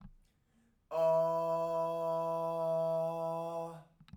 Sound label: E3 chest, Chest voice, singing